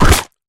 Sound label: Easter Clover Destroyed; fowl; Easter Blocks